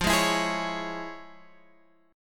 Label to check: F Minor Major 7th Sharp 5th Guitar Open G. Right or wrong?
Right